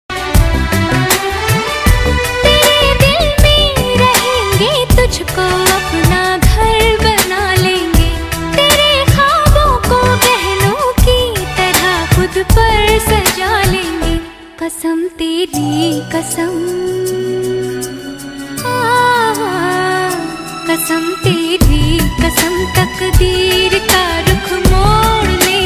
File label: bollywood song love hindi song